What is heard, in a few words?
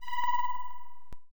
spikes